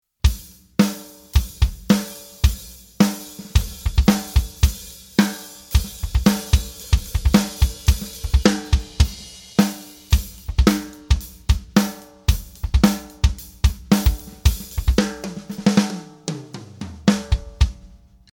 Kick Snare Mics solo; So klangen die Stützmikros für Kick und Snare alleine - gemastert; Aufgenommen wurde das ganze dann in Stereo auf einem Sharp MD-Recorder; Glyn-Johns

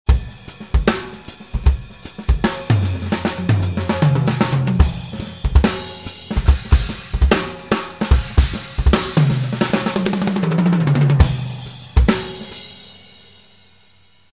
Shuffle Groove

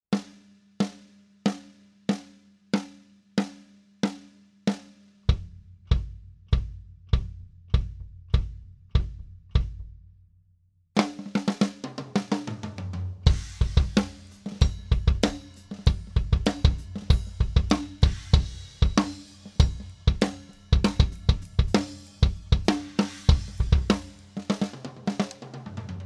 BD SN dry, Zoom H5

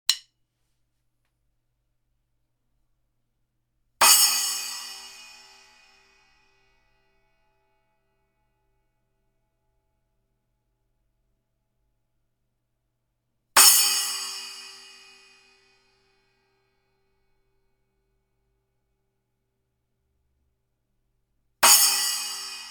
Paiste Signature 8 Splash, Cymbal

